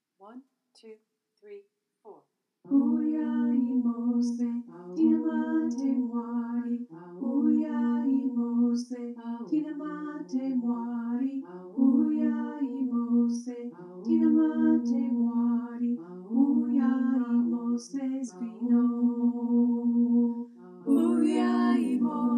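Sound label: Soprano descant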